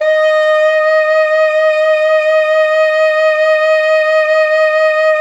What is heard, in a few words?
Pop Brass D#4 R; Best Service ProSamples vol.19; AKAI